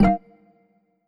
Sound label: button